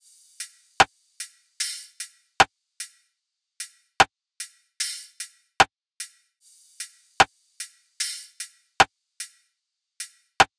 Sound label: Top Drum Loop 150 BPM; Drums; Top Loops; Humble Hip Hop Sample Pack